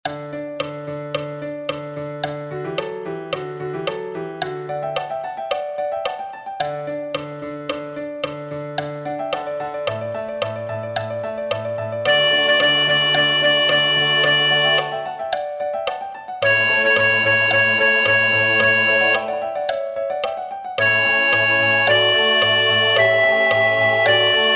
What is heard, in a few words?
Quoniam tu solus sanctus, Soprani; VIVALDI Gloria